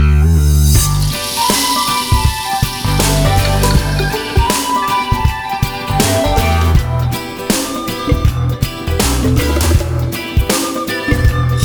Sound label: Rainforest; Frogger Beyond; GC PS2 Windows Xbox